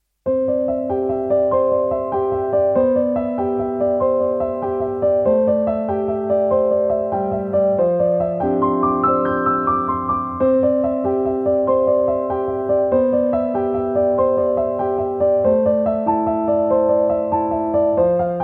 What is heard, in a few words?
Gentle Rain